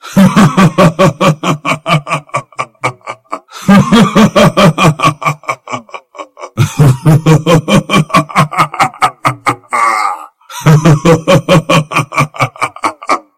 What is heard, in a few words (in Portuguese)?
risada; zed; zed laugh br